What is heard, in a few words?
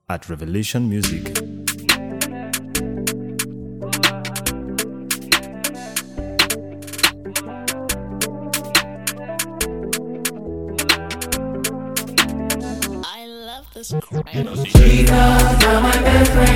Drill Version